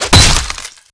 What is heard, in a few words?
kill smash